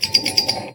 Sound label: pinball bell